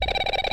blrb, sfx; animal; birb; Mutant

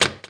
trap

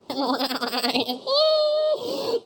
snicker

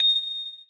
gem drop

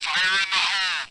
bomb

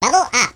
level up

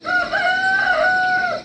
cockadoodledoo; Animal Sounds; Various Animals; Short calls

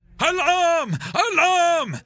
alarm

